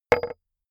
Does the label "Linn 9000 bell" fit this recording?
No